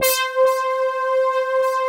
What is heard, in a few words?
ATTACK PULSE, TECHNO; Pro-Rec - Synthsonic; Z1 TECHNO